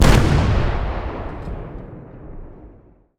ships destroyer attack; Hearts of Iron IV Death or Dishonor

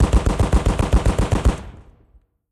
light airplane fire; Hearts of Iron IV Death or Dishonor

